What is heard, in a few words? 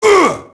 merc get hit; Max Payne